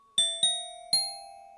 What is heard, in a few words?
Hovering warbler